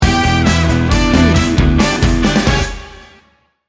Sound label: party power sting bumper